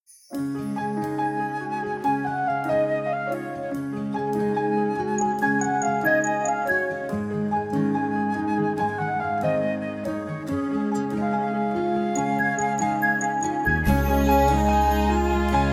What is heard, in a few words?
Accompaniment